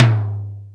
TON Md Clear, Alesis D4

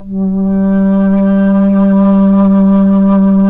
NYE FLUTE; Nye Flutes

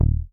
P BASS MUTES D1

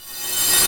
FX; AMG Megabass REMIX! VOL-2

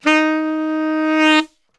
D#2 SAX; Best Service; Brass Super Section

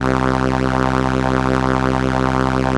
ARP SE C1; Vintage; STRENS+VOCDR; Sonic Reality; Vintage Timetraveler

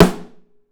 Drums, STEEL; 3D Drums

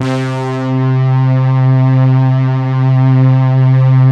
BRASS; Greytsounds; Scott Peers Sound Engineering

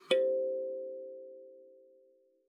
KALIMBA C5; Xsample VOL-7

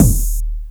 BD, Dance Mega Drums, BD HOU; Best Service

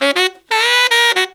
160 BPM, Horns; Zero-G; Phantom Horns

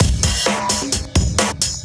Beat Style, 130bpm, breaks

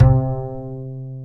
Acoustic Bass

